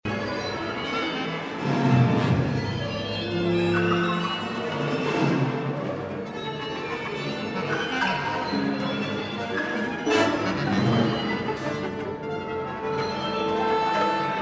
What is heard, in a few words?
quodlibet for 6 percussio; nunes